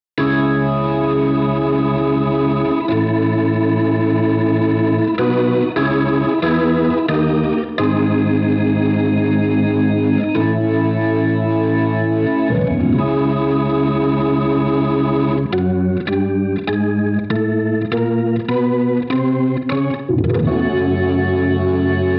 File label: Hammond Burn